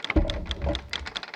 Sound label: Spin Button Hold Loop Animation